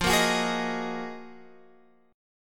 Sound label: F sharp Major 13th F